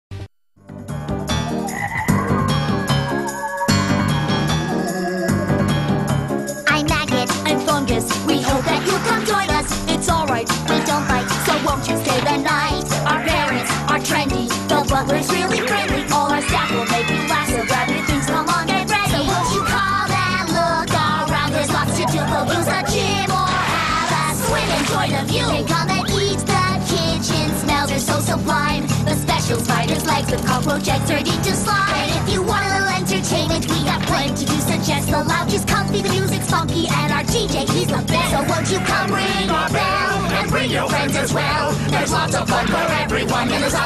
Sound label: Intro Song; Zombie Hotel